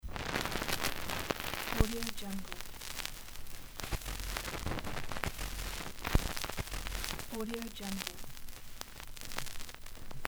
crackling vinyl

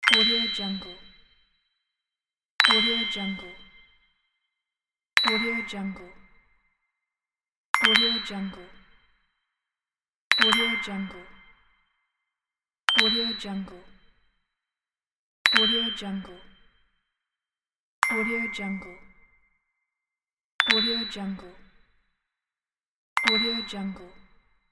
notification; sergeyyukon

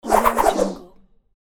acrobatic spinning whoosh

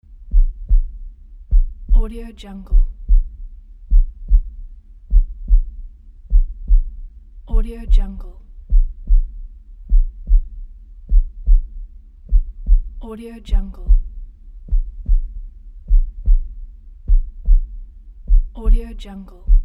heartbeat

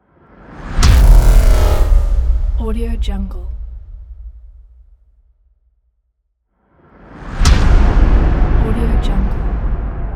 tense impacts; anderington

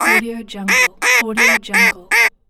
duck quacking

